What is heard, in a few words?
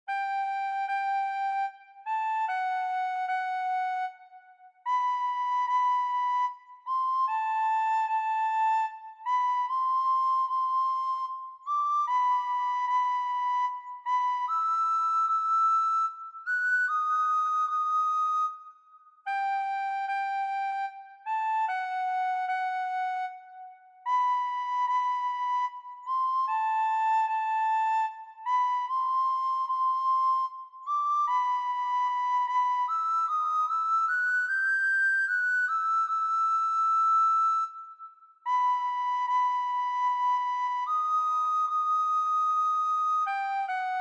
sarabande recorder quartet soprano recorder; handels